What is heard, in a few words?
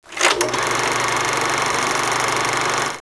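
projector; casa; objetos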